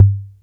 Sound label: RD-9844, DRUMS + PERC; Rubber Chicken Software Sample Bank; OTHER DRUMS